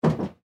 Door Patient Room, Door Patient Room Shut Doctor Office; Hospital; Emergency